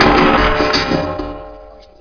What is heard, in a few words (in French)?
vitrine